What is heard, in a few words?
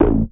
Bass; Big Fish Audio; Old Skool House